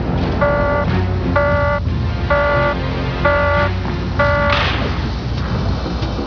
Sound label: start